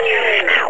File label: sound shut down